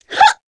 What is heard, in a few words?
succubus shout; queen girl